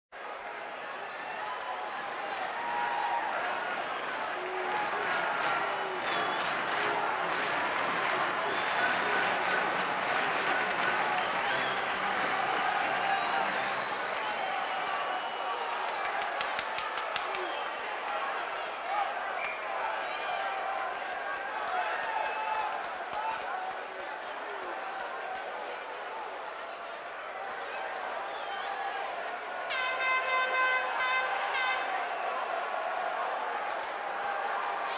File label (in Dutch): Hockey Algemene Sfeer; KMP Film Video Sound Effects